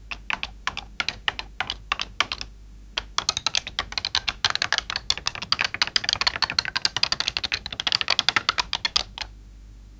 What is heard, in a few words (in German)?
eine schachfigur bewegt s, chess